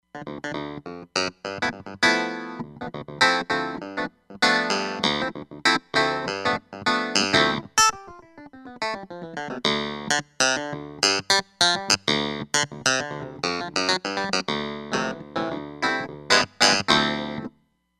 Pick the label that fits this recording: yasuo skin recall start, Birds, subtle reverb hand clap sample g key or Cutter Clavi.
Cutter Clavi